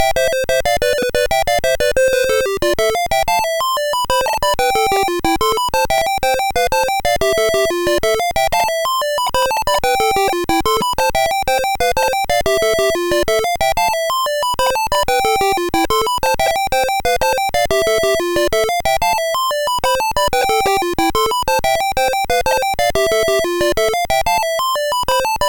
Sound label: Musicbox, sid MOS6581R4